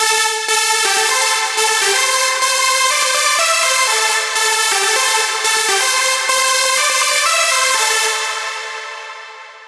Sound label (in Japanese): Nexus 例 Saw リード